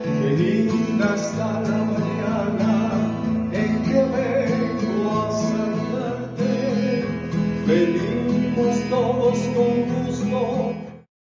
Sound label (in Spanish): Las mananitas; crepe celebracion